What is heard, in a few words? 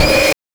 BANK2 SFX, NES DPCM; MIDINES